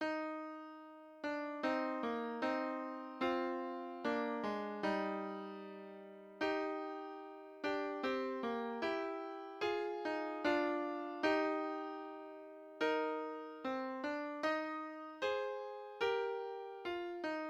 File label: Piano Solo; Fairest Lord Jesus